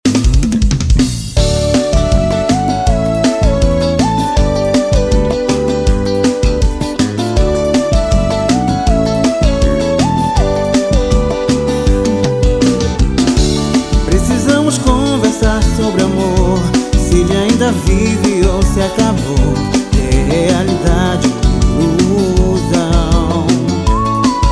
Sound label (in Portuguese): banda brega pop